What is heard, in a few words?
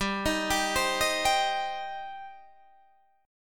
G Suspended 4th Sharp 5th G, down, Guitar, Standard; Gsus4#5 arpeggiated; JGuitar